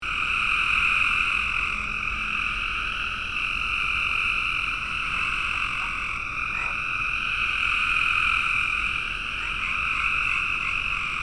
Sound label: forty minutes of frogs; Post-dusk in a bog, Raleigh NC, 10 June 2009. 40minutes0sec.